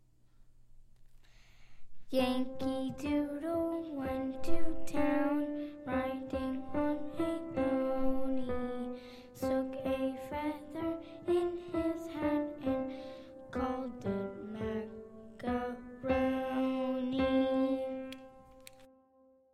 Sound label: Yankee Doodle Vocals